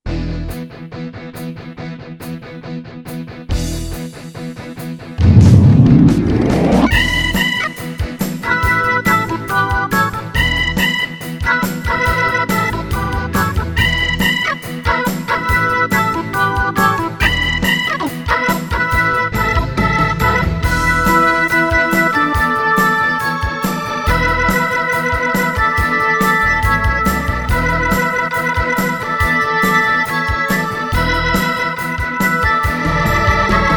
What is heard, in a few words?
Rock Hammond